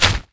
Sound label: slash